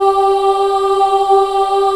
G3 CHILD OH, Choir; USB Soundscan vol.28; Acoustic & Synth; AKAI

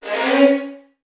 VN FX, Virtuoso Strings

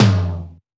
Old Skool House Perc

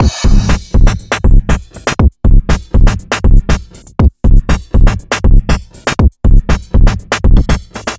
hip hip 120bpm, Downbeat Dance Grooves

